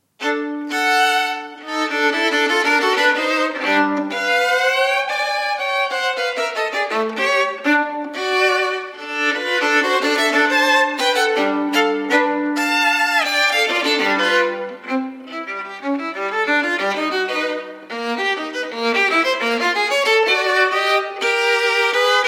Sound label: theme with 8 variations for solo violin variation i moderato